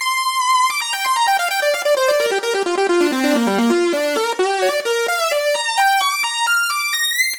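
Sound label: G Flurry Lead, 130 bpm; Zero-G; Future Wave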